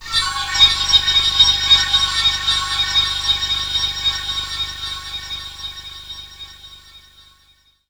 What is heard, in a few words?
Dispatch Drone; Jungle; Blu Mar Ten - Jungle Jungle - 1989 to 1999 Samplepack